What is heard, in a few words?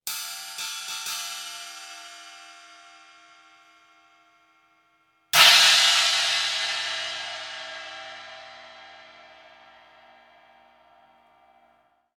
Sabian